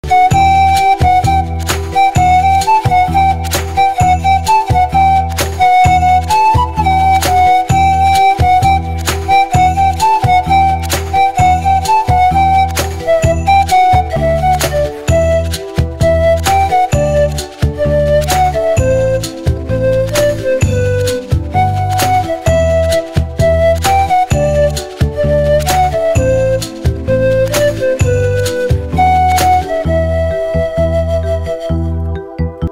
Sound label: flute ringtone; rhtdm